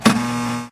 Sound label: piston extend